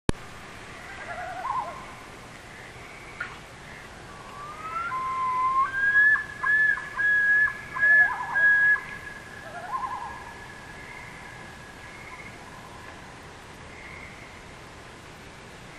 Night, nature; Midnight has passed; Northern Nocturne; Half your mind wants to sleep, but the other half doesn't want to miss the performance.

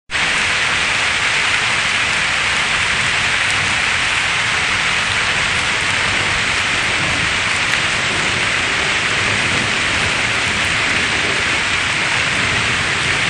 thunder short; More Thunder

